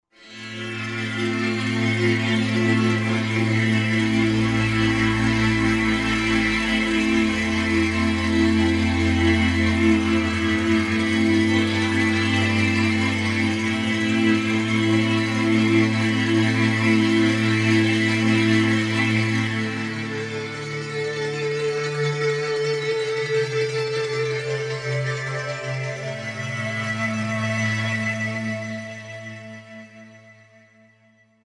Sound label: Slo Eastspin, Roland SR-JV80-14 World Collection Asia